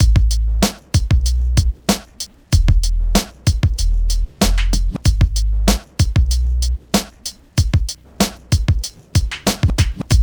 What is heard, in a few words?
BEAT 95, Beats 93-96bpm; PlatinumPhattCD1; E-MU Producer Series Vol. 8 – Platinum Phatt